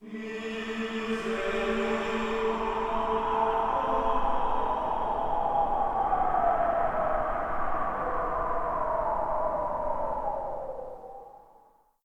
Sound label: Singing; l ange et le demon cd i gamerip 1992